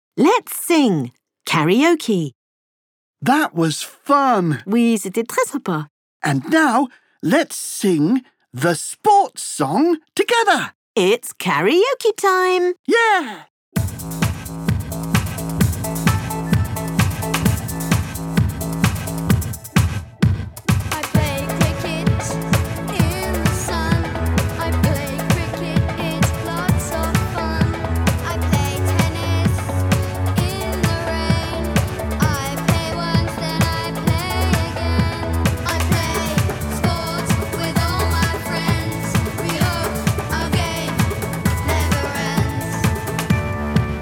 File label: Let's sing The sports song KARAOKE